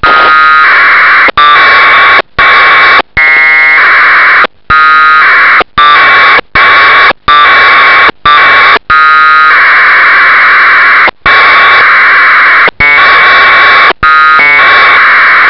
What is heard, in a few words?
afsk test